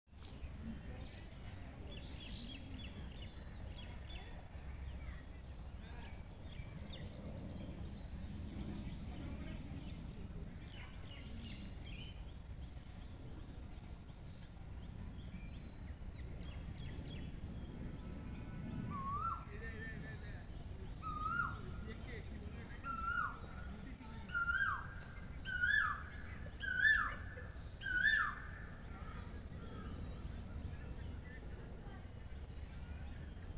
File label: birds